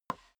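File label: step boots wood creak slide short, footsteps; schritte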